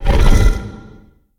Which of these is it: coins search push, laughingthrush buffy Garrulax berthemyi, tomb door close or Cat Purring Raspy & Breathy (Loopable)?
tomb door close